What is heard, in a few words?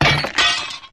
glass shatter